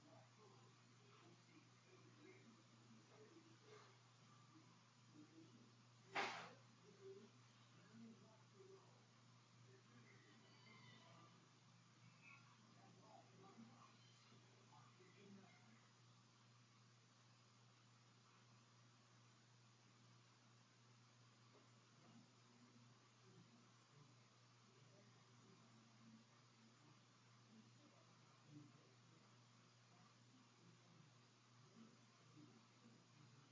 Empty Room; Night 1; historic rainworth lodge investigation

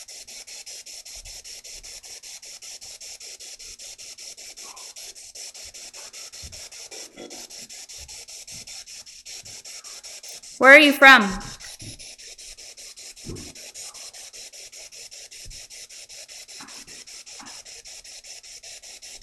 Ballroom Spirit Box

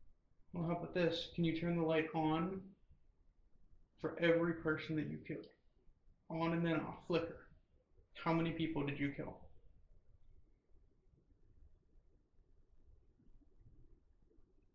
Room EVP

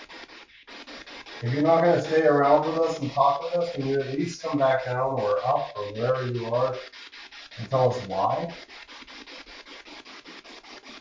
Walking to Vestibule Spirit Box

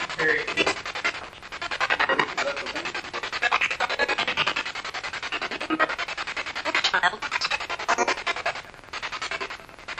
Spirit Box; 2nd Floor Hallway

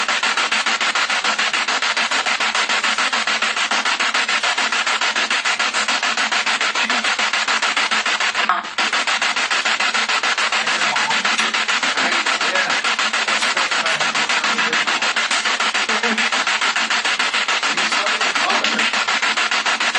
Kitchen Spirit Box

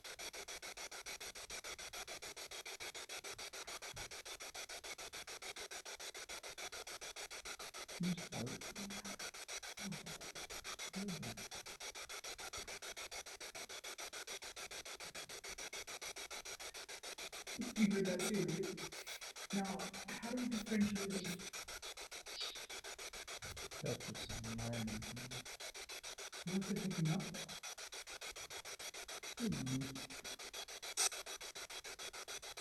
Spirit Box; american renaissance church investigation; More footsteps were heard here at :09.

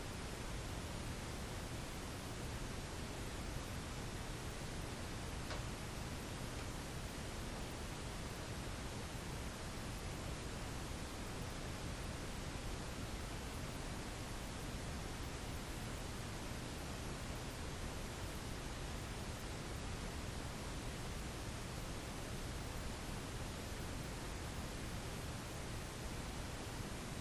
Tattoo Shop Static

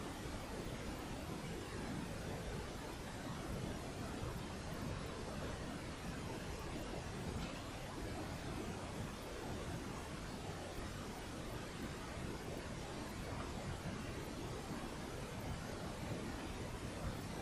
Barn EVP

